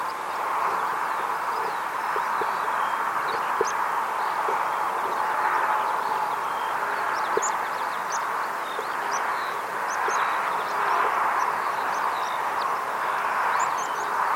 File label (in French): bergeronnette printaniere